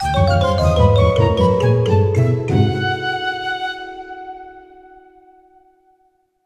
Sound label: Level Failed; luigis mansion dark moon; 3ds switch; Haunted Towers